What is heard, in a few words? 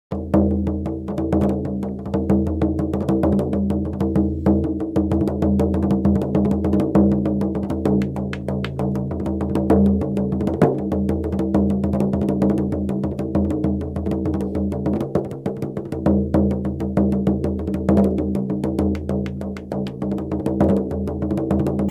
Bodhran